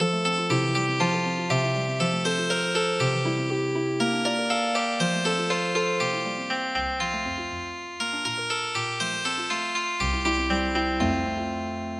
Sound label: Healing Harp, traditional; The Pig Went To Market